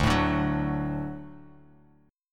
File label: D Major D down Guitar Open F; D strummed; JGuitar